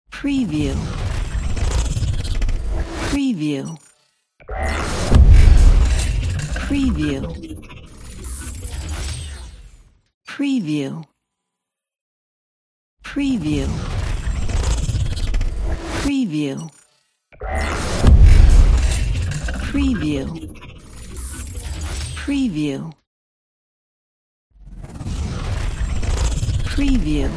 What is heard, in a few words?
high tech transforming logo